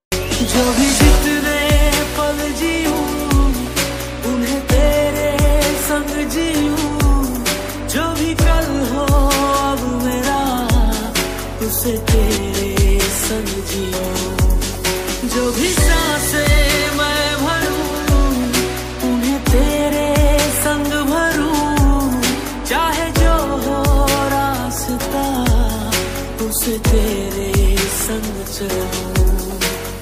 New Hindi Song Ringtone

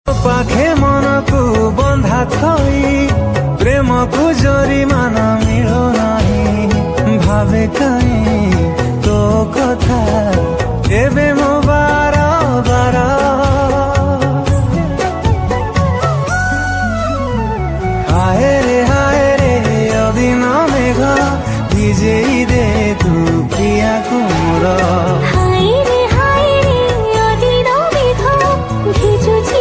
Odia New Ringtone

